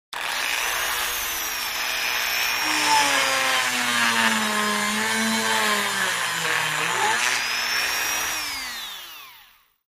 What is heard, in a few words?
Dremel tool grinds wood at variable speeds, then shuts off.; Tools, Hand Grinding, Tool Motor, Tool